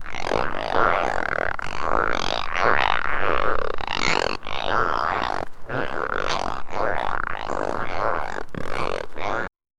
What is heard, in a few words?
a large group of crabs